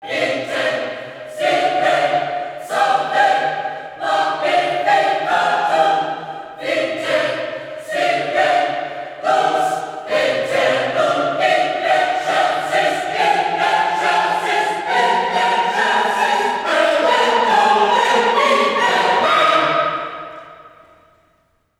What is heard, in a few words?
Choir; трейлерная музыка